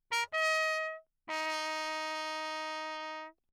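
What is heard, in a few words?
trumpet mute Bach signal; Perinétventiltrompete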